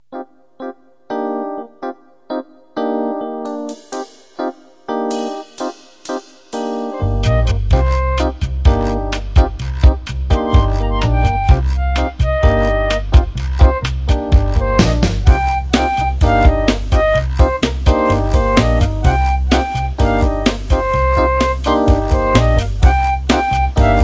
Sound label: jazz